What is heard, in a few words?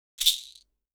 caxixi